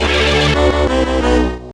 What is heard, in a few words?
Jingle Race Timeout